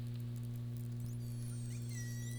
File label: Globicephala melas